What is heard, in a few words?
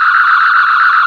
phaser firing, weapons